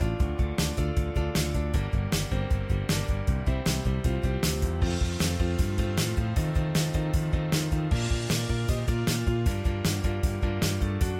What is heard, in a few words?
Instrumental